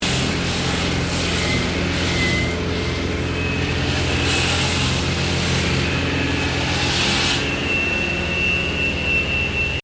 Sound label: Concrete Saw Cutting; Various machines; Saws